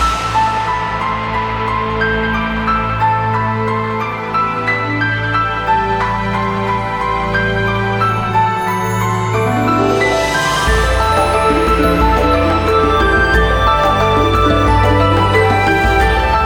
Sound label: Pray Loop; Murasama; Android